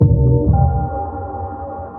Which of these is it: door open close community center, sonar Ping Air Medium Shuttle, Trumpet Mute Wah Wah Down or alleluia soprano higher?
sonar Ping Air Medium Shuttle